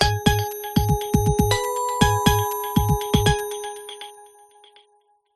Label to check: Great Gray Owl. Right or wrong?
Wrong